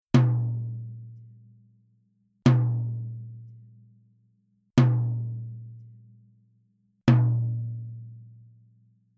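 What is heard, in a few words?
TOM Onyx Soft, drum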